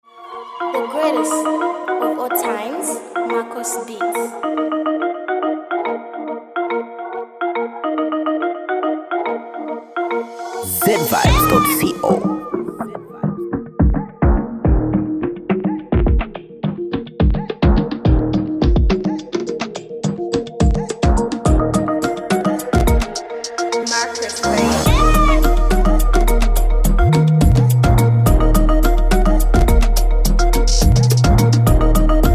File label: Dancehall Free Beat